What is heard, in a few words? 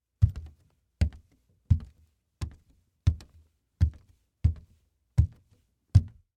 Footsteps Bare Foot; BlastWave